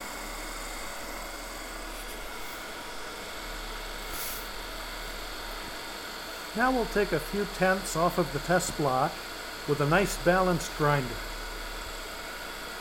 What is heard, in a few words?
grind test disc